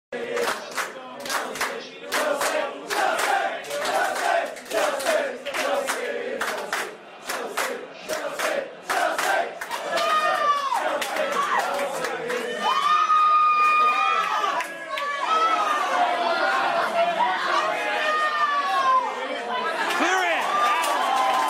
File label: Crowd Chorus; Chelsea F.c.; Chelsea community